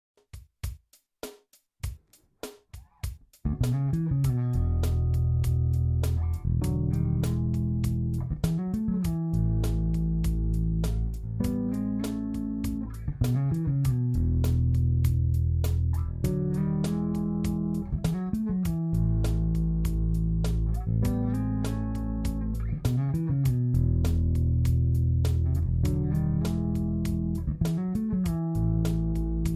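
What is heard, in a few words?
Chording w doublestops E7 to A7, bass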